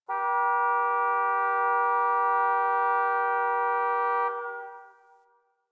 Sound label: Distant Car Horn